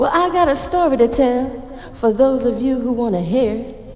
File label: VOX GENERAL; AMG Megabass REMIX! VOL-2